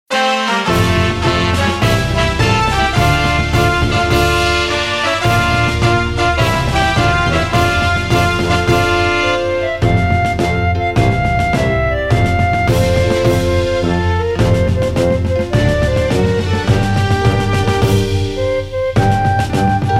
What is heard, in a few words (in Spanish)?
himno instrumental; quito ecuador; himno a Juan Martin Moye